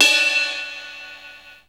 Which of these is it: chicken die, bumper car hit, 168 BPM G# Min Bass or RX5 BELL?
RX5 BELL